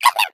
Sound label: hot laser; player